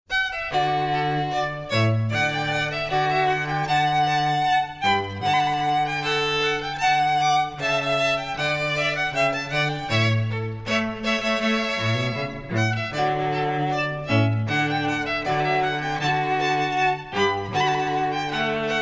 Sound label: trio rondeau; mouret